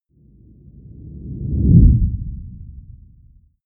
whoosh low slow

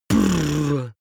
beatbox bass drum 808 damped

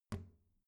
plastic trash can close soft

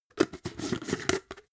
jar glass plastic close fast

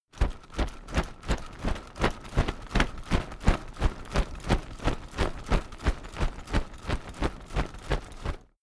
umbrella telescopic shake

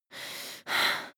breath female highly aroused

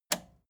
record player repeat push

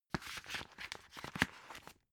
dvd case put in booklet

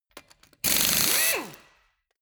impact wrench screw single

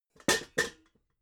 pots stack

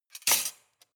cutlery large multiple drawer place

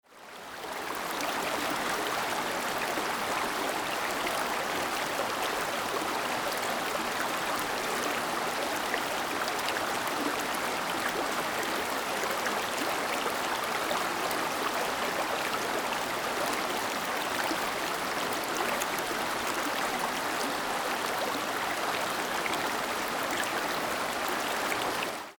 river medium size atmo

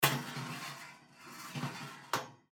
kitchen noise

